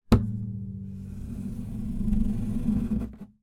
musical guitar acoustic down then drag along carpet floor